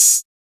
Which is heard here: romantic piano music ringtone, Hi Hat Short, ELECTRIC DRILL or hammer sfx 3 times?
Hi Hat Short